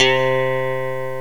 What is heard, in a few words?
Clean Guitar